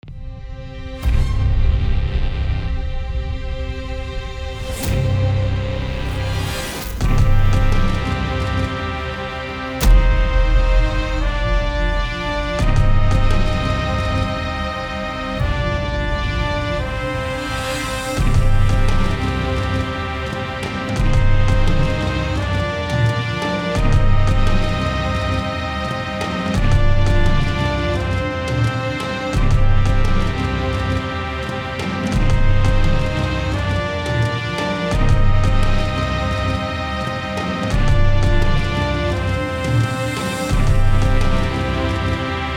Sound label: Trailer music